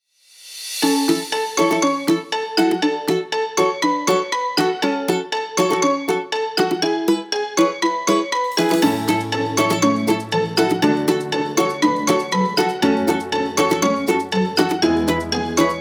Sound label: game music